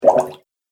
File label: Goop Sound, cartoon; Cartoon Sound Design Sound Effects